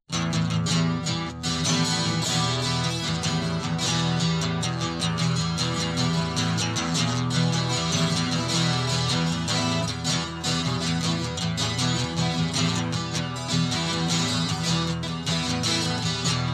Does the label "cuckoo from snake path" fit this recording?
No